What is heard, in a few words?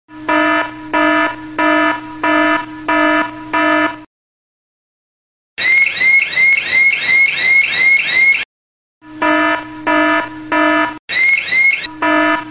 Android Alarm Sound